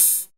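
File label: HH, hi-hat; Sound & Vision - Gigapack I; DANCE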